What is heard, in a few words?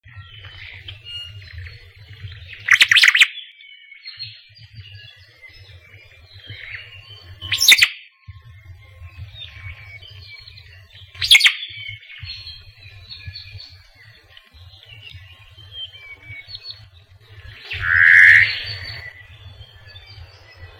omao long